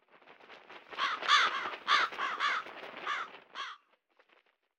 Crows fly away; the 39 steps

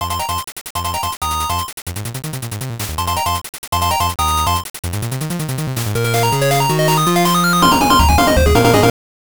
Unknown Jingle, Virtual Boy; Nester's Funky Bowling

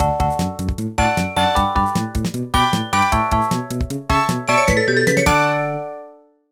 Music Gen Success Perfect

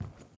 foam floor walking; SFX; PlayerWalk

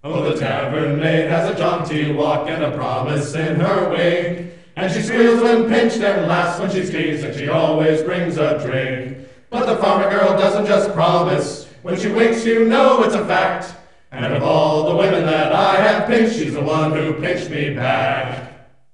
Tavern Song

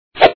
Hit; pencak silat 2002 gamerip online

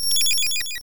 Light Screen

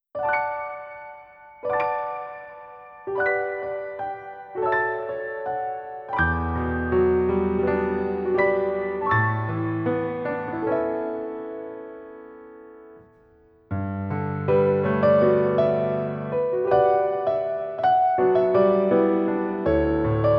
Piano solo; Himawari no Kyoukai to Nagai Natsuyasumi; Kiss Kirari

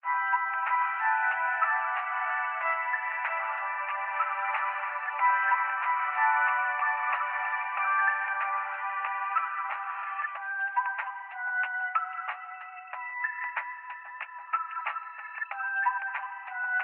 muffled; Sub State (room)

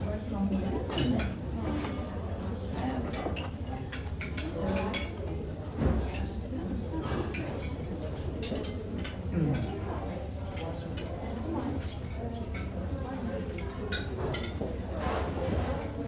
Restaurant; the 39 steps

